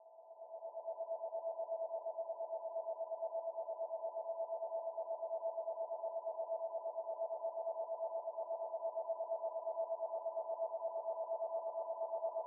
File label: harmony